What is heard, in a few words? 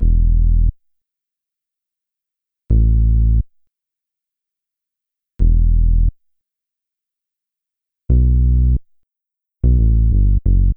Bass Hip-Hop